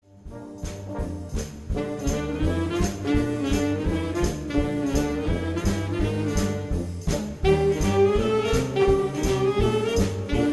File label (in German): Jugendorchester Boogie; Jugendorchester Neuwürschnitz